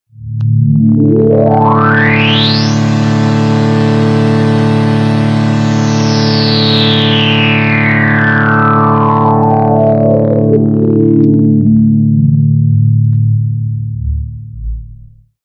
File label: slow burn, zaps and beeps